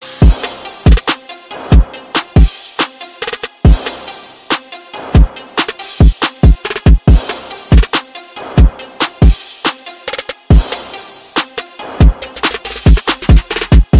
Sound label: Dark Trap